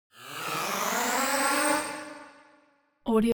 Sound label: cinematic swell fake orchestra short